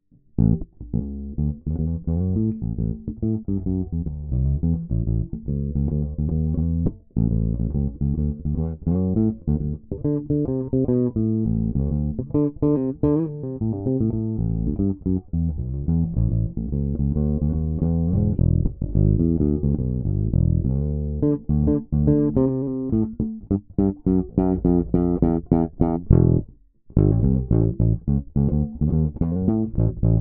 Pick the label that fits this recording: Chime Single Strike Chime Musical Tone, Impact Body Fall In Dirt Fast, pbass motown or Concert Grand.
pbass motown